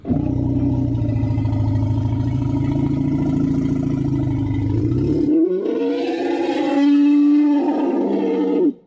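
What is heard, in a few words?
roar of Indian elephant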